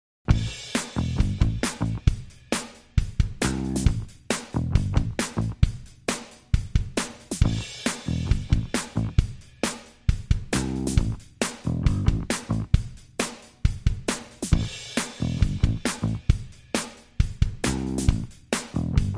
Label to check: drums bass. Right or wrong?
Right